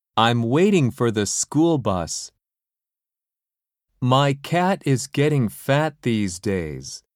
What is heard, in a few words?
harmony; 新課程英語教科書 指導用音声CD